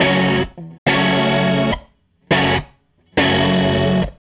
SG Guitar Loop

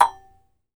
jaw harp, Pitched Percussn, Jaw Harps

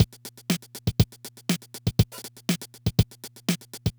Loop, dd-5

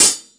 HH, Drum Dry; East Collexion; Drum 1 Dry

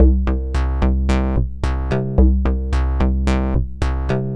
MODU C, Modular Synth Loops

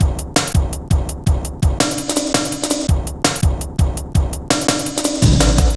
Bouncy, Jungle, Drum Loops 166.5; Zero-G Jungle Warfare 1, 2 & 3

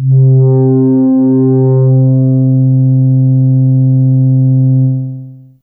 MB Wow Sus C2, Analog; Fairlight CMI IIe

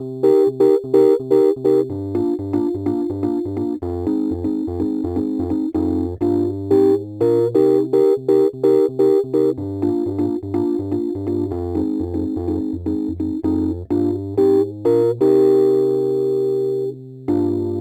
Rhodes Attacks; Ueberschall

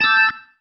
Stab, Organ, One Shots; Loops; Stabs; Sonic Foundry (Sony Creative Software); Joe Vitale Organ Donor

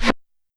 Reverse Snare, YAMAHA RM1X

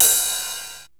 909 HITS; SINGLE HITS; Megabass Remix! 2

